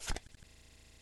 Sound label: Card Flip; video poker